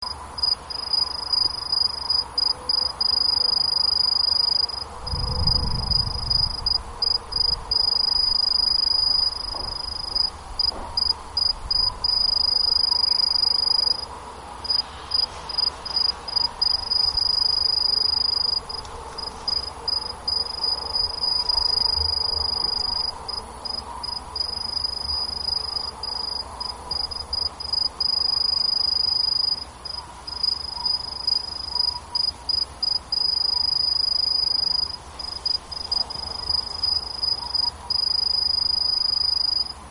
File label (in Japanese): kinhibari; ヤブキリ